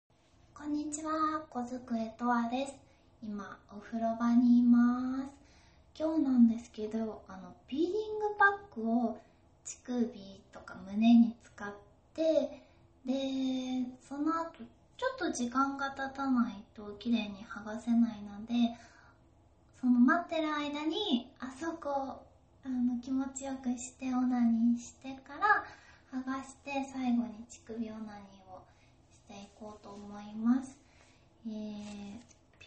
乳首責めオナニー くちゅくちゅ; ピーリングパックで乳首責めオナニー感じすぎてあそこもびちょ濡れくちゅくちゅ

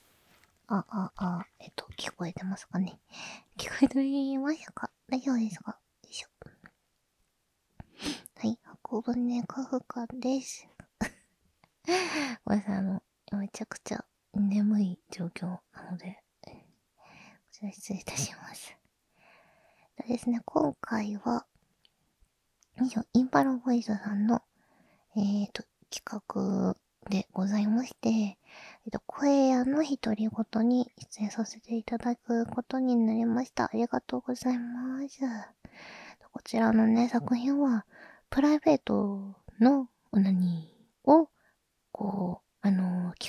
プライベートオナニー実演; 声屋のひとりごと

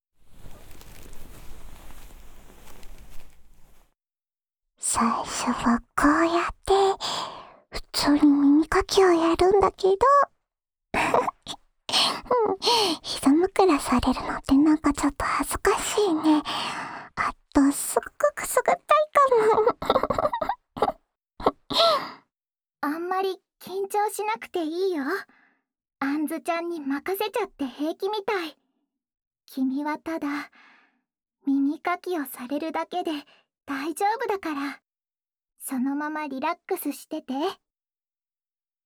普通の耳かき（右耳）, ASMR

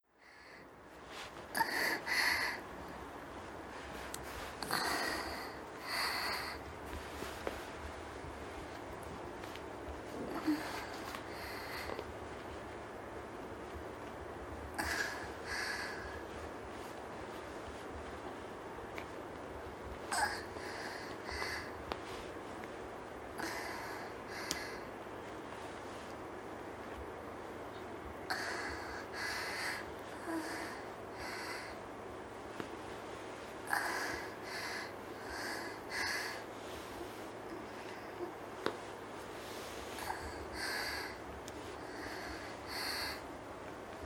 オナニーASMR お姉さんのエッチで気持ちいいガチオナボイス; 脳みそとろけるお姉さんのエッチで気持ちいいガチオナボイス2

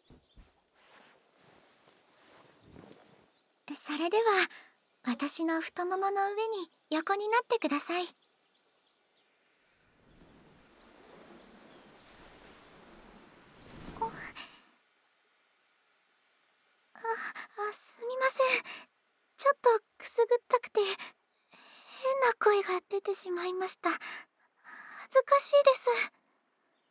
縁側で左耳をお掃除

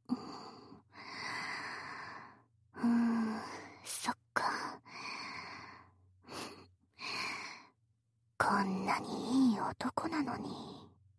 近所のお姉さんに耳がふやけるまで耳舐めで責められる音声

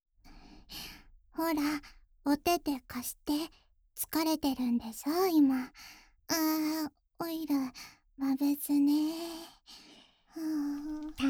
らぶらぶ手のひらオイルマッサージ, ASMR

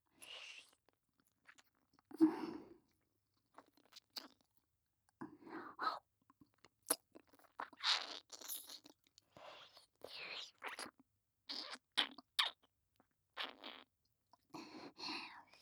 耳舐め, ループ音声, ASMR

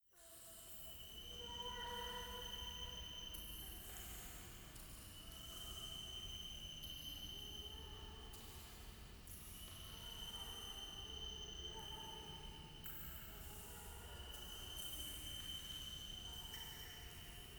ゴースト イラマチオ 山奥

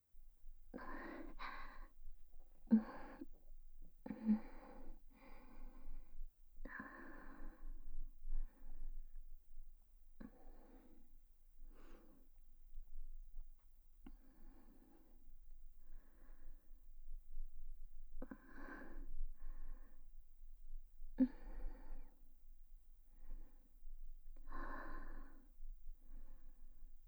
W濡れ耳かき（クリーム指耳かき）ループトラック, ASMR

